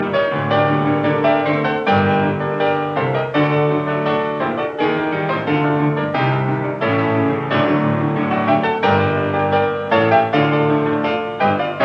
Piano Pieces Ballade in G minor; 6 Piano Pieces Op 118 No 3; Wilhelm Backhaus

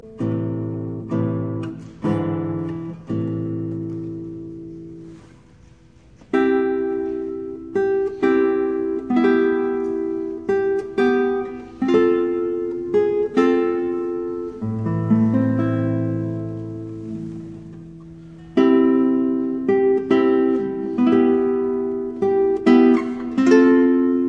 Preludio y Danza; Falu

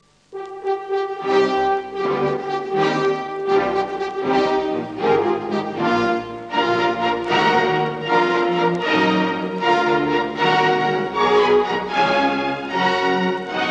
Symphony in C minor, Allegro; Beethoven; No 5; Op 67; IV Allegro

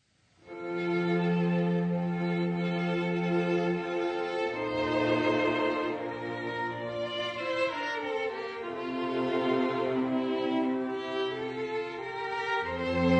Viola Quintet in C Andante

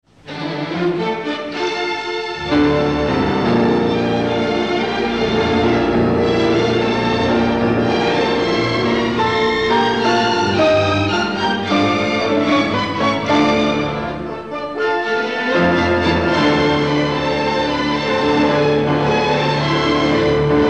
Piano Concerto No. 2 II. Allegro appassionato; Brahms

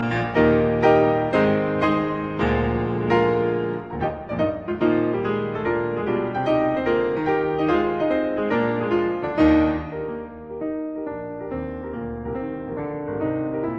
Fantasie in C major Massig Durchaus energisch; Op 17; Juana Zayas

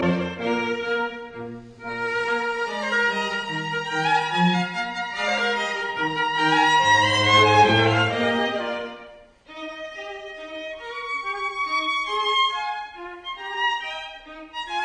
String Quartet No 5 in E flat major Menuet; Haydn

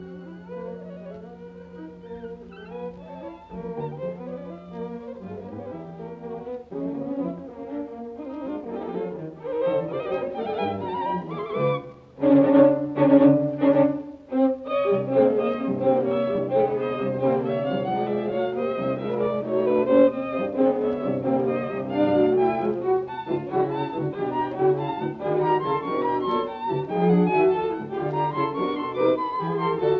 String Quartet No 5 BB 110 I Allegro; Bartok

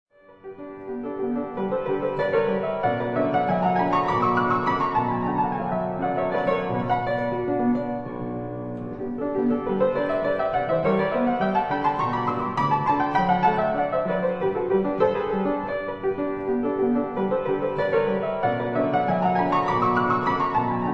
Etudes for piano, G major

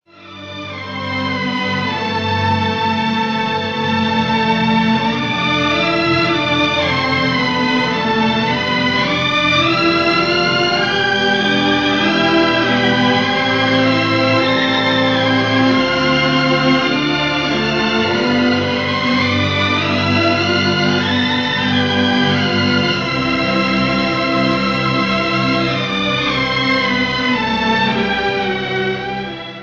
Andante Sostenuto Violin Sonata in A; Bach (arr. Stokowski)

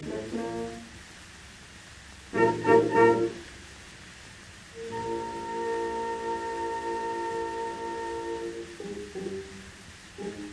Symphony in E flat major Finale Allegro molto; Beethoven; Op 55; Eroica; Oskar Fried

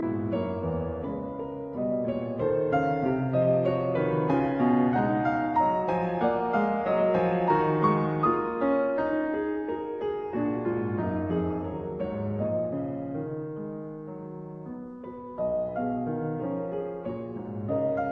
Preludes in E flat minor Andante; Op 103; No 6

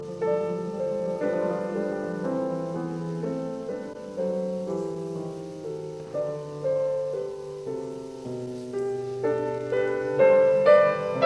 Variations in C major on a Waltz; 33 Variations; Op 120; Variation 24; Fughetta

